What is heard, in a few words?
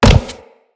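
footstep on metal, enemies; Footsteps; Grineer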